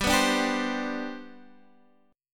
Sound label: C Major 7th Suspended 2nd G, chord